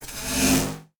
Factory Crumble Block

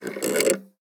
Enlarger Aim Counterclockwise

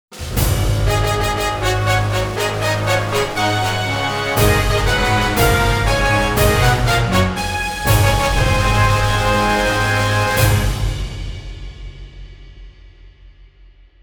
BGM Classical; Pokemon X Y

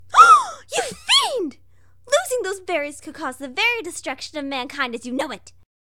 Destruction; Hilzartov; Fairy Size Doesn't Matter

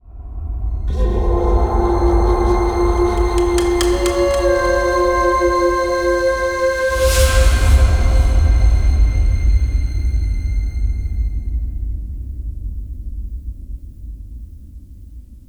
environment music stinger; level 02

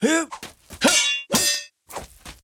Diraqiknight Attack

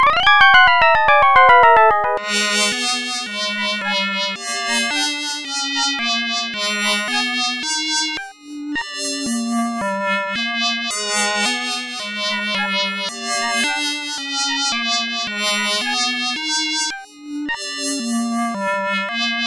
Game Over (Sound Blaster); Home Alone 2 Lost in New York; MS-DOS

